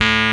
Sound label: DRHODES; E-MU Formula 4000 Series Vol. 5; Protozoa; Vintage Presets